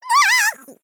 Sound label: female gnome, Death